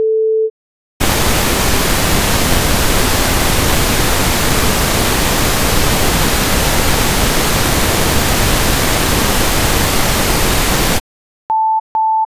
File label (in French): Test phase enceintes